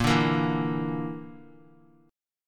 Bb Major Flat 5th, Guitar; strummed; JGuitar

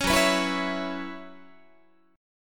F sharp 7th Suspended 4th, Guitar; strummed; F#7sus4 Chord

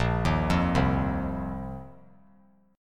Bb Suspended 2nd Suspended 4th Bb; Bbsus2sus4 arpeggiated; 10,10,8,10; JGuitar

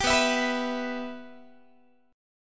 B Suspended 4th Sharp 5th B Guitar; Bsus4#5; strummed; 22,19,19,21,20,x

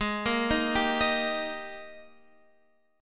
G sharp Major 7th Suspended 2nd; arpeggiated; x,11,8,8,8,11; G#M7sus2

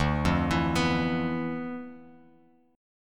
D Minor 6th Add 9th D, Bass Standard; Dm6add9 arpeggiated; JGuitar

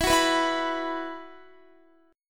E Suspended 2nd, Guitar; Esus2; strummed; x,19,18,16,16,19

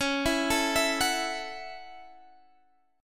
A 6th C sharp

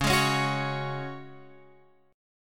Db Suspended 4th, Guitar, Open F; strummed; x,8,8,8,9,8; Dbsus4

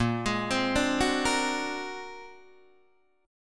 Bb Augmented 9th Bb, Guitar Open D

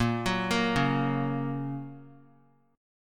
A sharp Minor Sharp 5th, down; arpeggiated; JGuitar

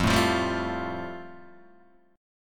F Major Add 9th, Guitar DADGAD

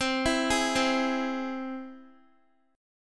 C Augmented down Guitar; arpeggiated

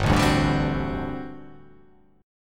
G Major 7th Suspended 2nd Suspended 4th G down

